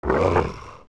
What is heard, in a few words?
Dead Hand Bite; OOT